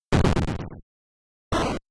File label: Push Start Key; Skate or Die